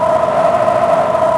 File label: fast, engine; Quantum Redshift; XBOX; kaitlyn